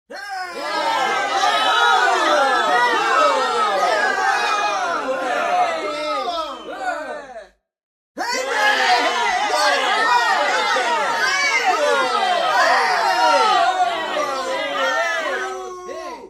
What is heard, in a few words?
Football Fans Yelling at The Referee; EVG Sound FX; Loyal Fans